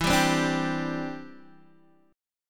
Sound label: E Minor 7th Sharp 5th, down, Guitar, Open D